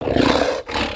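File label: Sabertooth Approval; creatures; pets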